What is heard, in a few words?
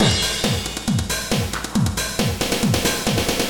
drum